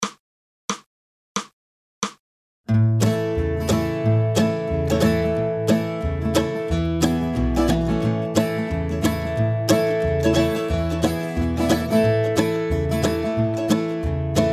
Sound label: 180 bpm, rhythm; Old Joe Clark